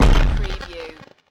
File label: Glitch; Sound Effects Bible